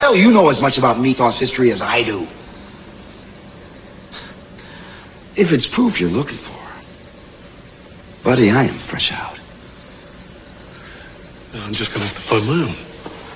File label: horse